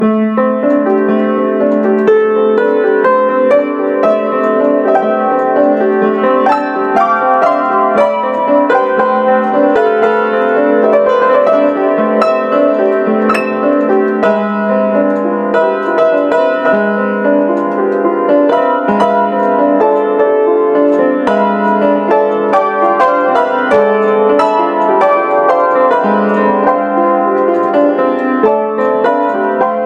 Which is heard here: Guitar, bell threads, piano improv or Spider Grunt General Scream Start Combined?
piano improv